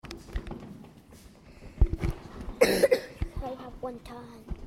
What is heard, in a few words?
coughing